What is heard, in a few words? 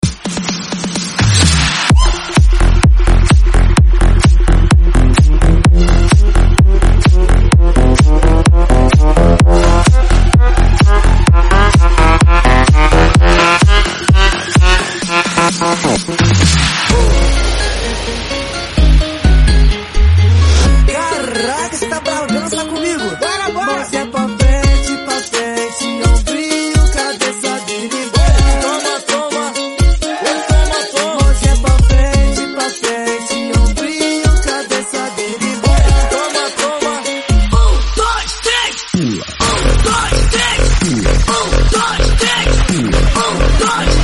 Pagodao MELBOURNE BOUNCE DIRTY 128BPM